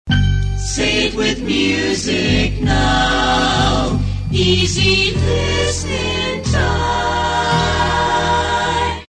jingle

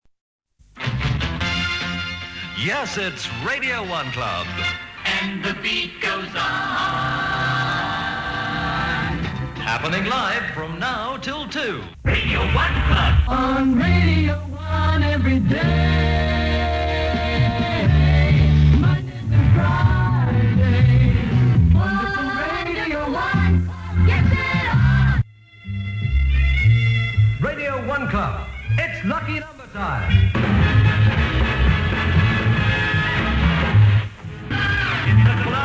club jingles

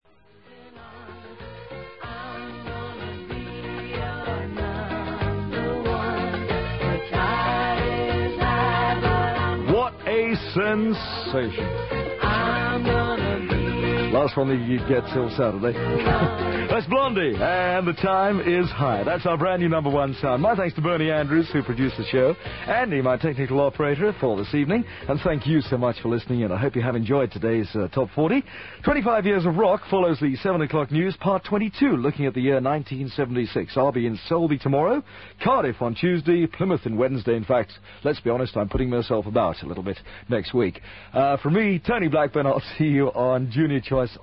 radio 1 news jingle; Tony Blackburn; 1978

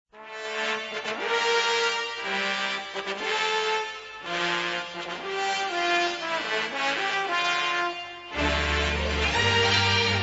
industrial report music; Steve Wright

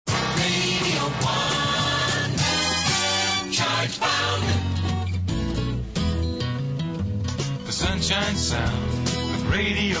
jingles

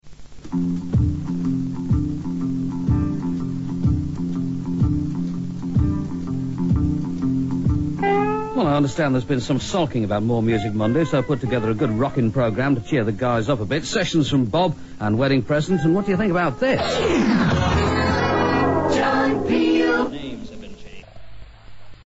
name jingle; john peel

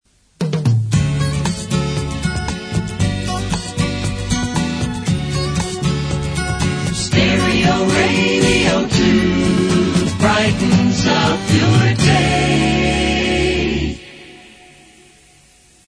jingle

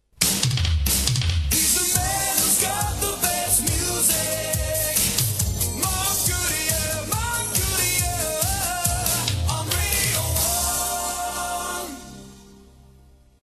best music jingle; mark goodier

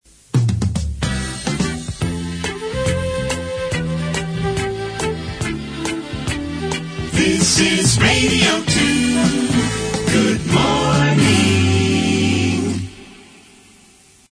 jingle

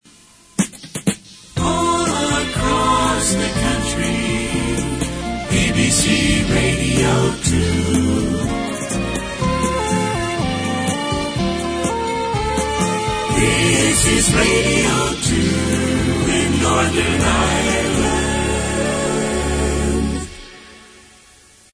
jingle

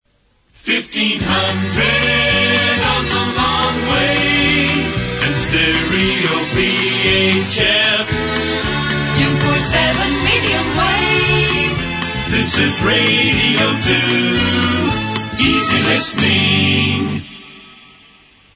jingle

